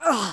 spirit death; FlyForInsanity

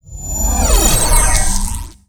Ele casting; Fly For Insanity

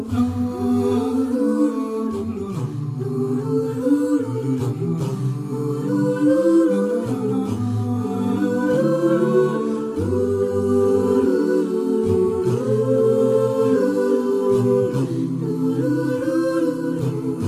music map loop; Candy Crush Friends

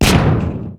fire boomerang; Pc Skill; FlyForInsanity

